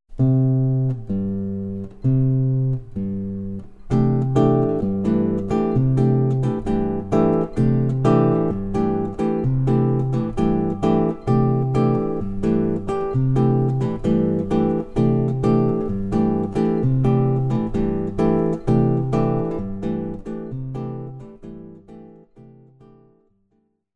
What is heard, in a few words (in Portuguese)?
Bossa Nova adicionando bassline raiz e quinta